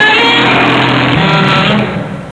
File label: godzilla